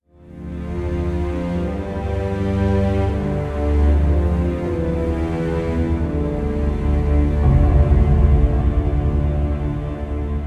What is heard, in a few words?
Watchpoint; assassins creed ii